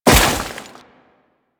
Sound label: Crewman Step, Footsteps; Warframe; Corpus